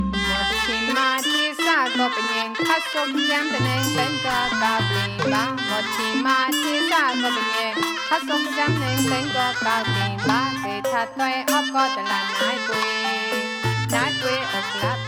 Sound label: Burmese Mix